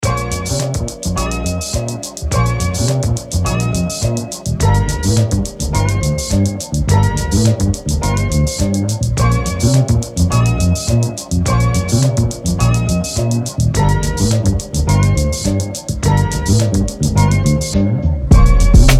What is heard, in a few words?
Instrumental